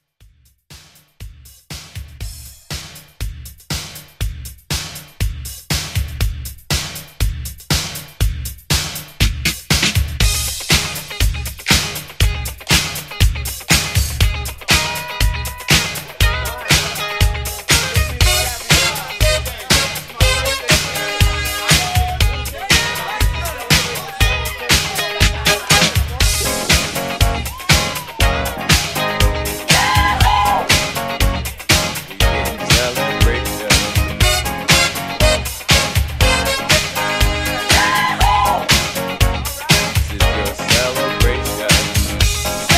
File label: 80s Dance Redrum 120 BPM